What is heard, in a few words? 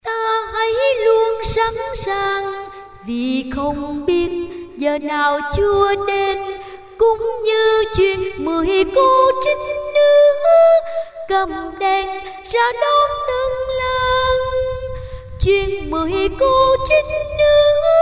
sop tenor